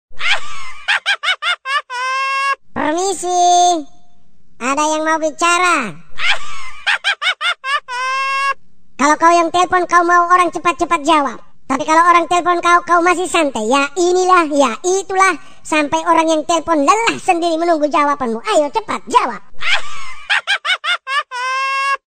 crazy laugh; minion

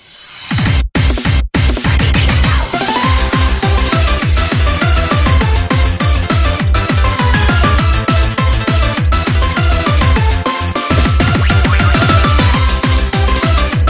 ringtone

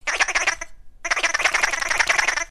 angry chipmunk; risada; angry birds

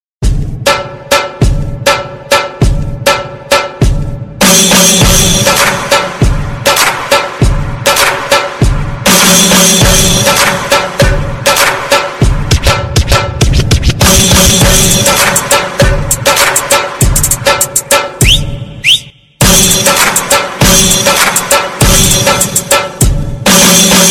funny ring tone

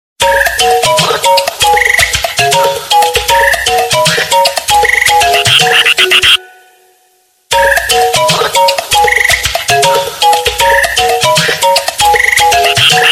crazy bird phone ring

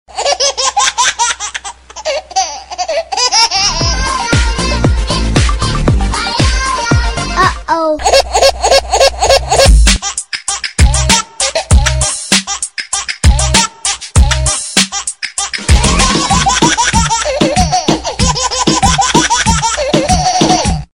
crazy baby mix laugh

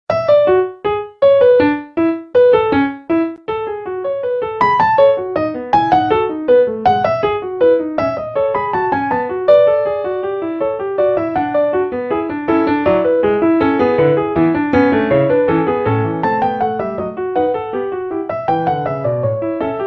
Nokia Piano; toques para celular

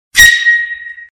high alarm sound

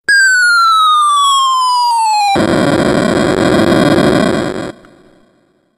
game over, som de game over video game

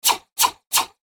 pew pew pew; efeito sonoro

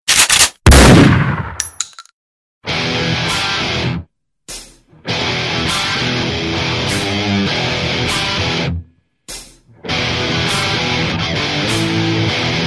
gunshot n metal

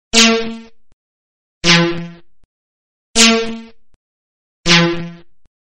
sinal alert ringtone

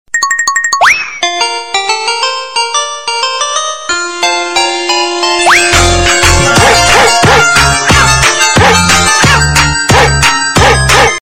cartoon tone ring

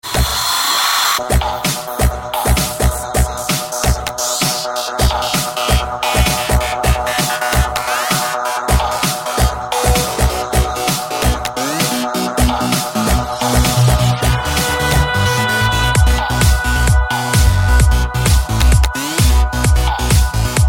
trance remix tone

